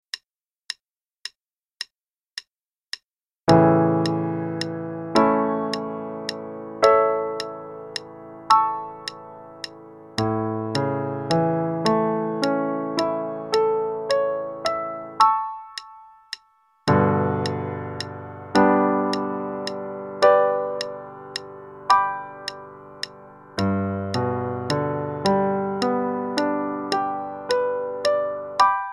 A Chords, qn=108; Level 2A - 2nd Ed; Cheers for A Chords

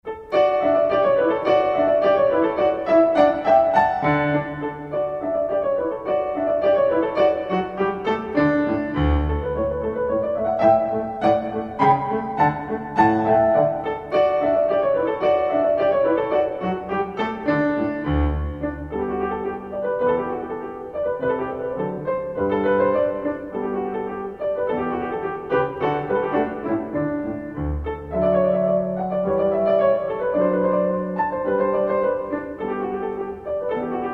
Angloise in D major; Marlais Book 4

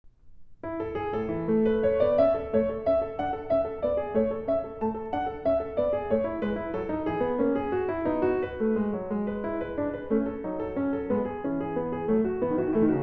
Suite in A Major Courante; Keith Snell Level 08 Baroque Classical; Telemann

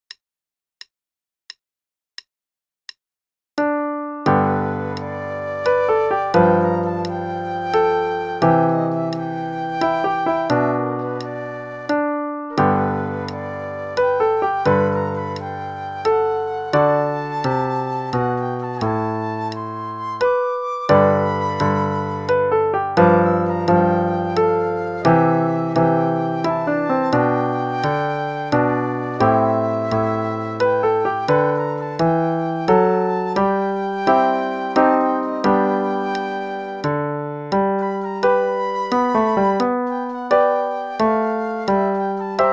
with flute, qn=88; Level 3A - 2nd Ed; Amazing Grace

